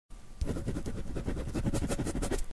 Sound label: eraser; پاک کن